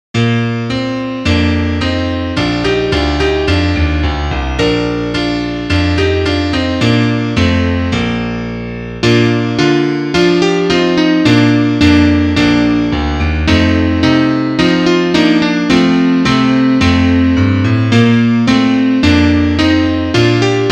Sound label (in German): Klavier; lasst uns froh und munter sein